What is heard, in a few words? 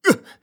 hurt; hero1